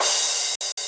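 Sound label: drum crash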